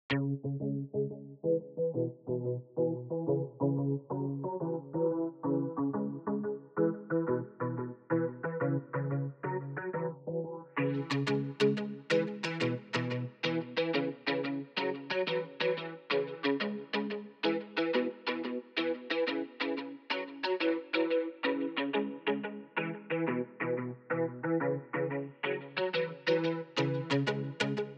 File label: GUITAR, BPM 90